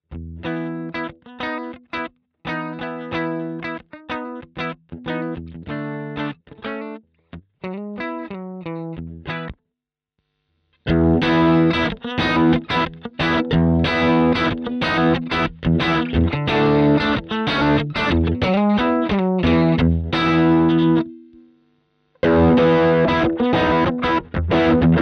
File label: ZZOMBEE OD Fuzz